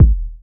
original kick drum single shot g sharp key, bass drum sample